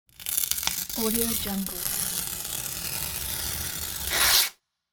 tearing paper

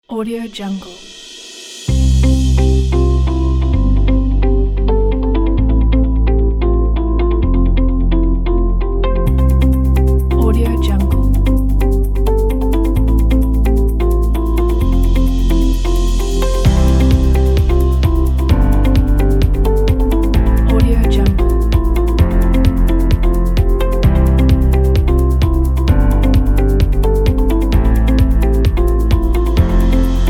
corporate presentation inspiration

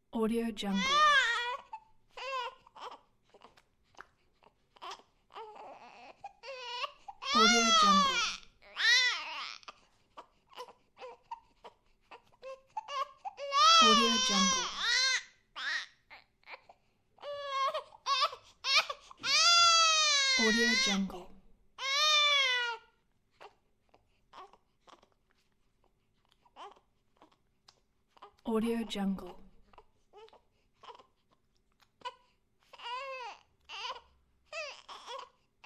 baby crying

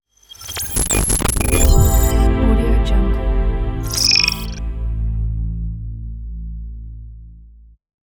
a glitch logo

